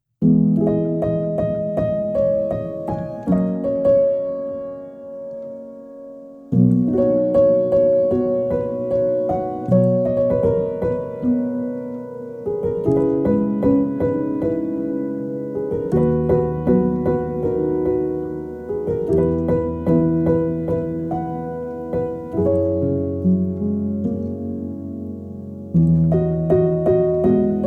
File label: Piano Version; Spirited Away